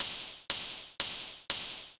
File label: NRG Cymbal